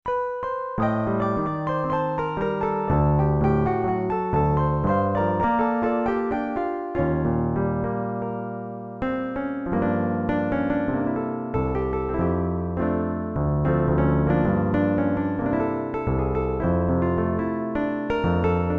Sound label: Grand Piano; r3dias